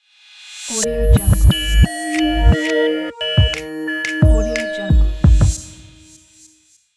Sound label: modern technology ident; happymusichappysounds